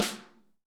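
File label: snare ambient; Best Service; Real Mega Drums VOL-1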